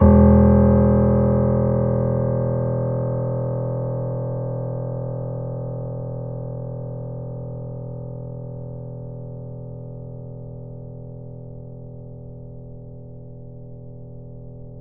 Grand Piano E0 mf; Ableton Live 9 Essential Training

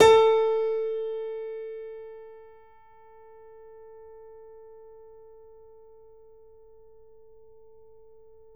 Grand Piano A3 ff; Ableton Live 9 Essential Training

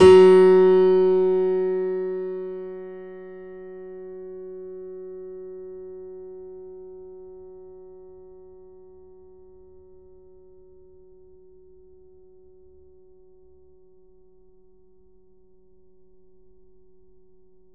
Grand Piano F#2 ff; Ableton Live 9 Essential Training

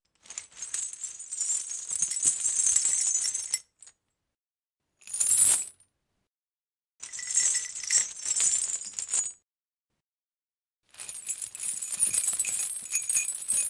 Metal chain

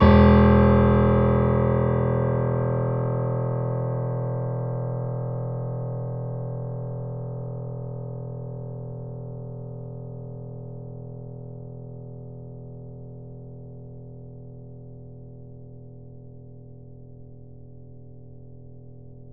Grand Piano E0 f

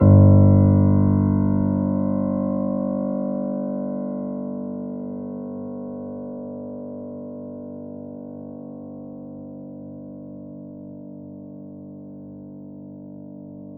Grand Piano G0 p

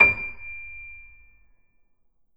Grand Piano D6 p

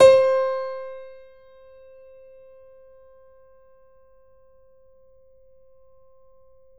Grand Piano C4 ff

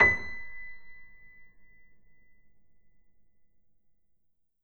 Grand Piano B5 mf

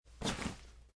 shoulder bag pickup

